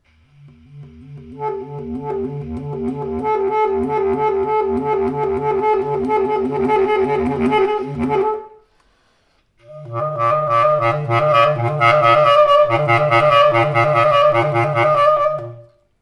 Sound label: loud attempts